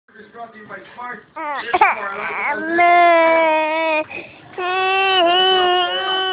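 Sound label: baby hungry, male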